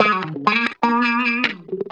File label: 125 d#, guitar licks; house; Vocal House; PS-28 Vocal House Samples; instrumentloops